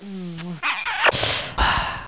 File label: KISS